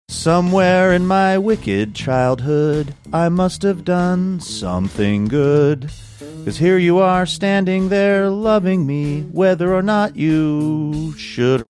singing somewhere in my wicked childhood i must've done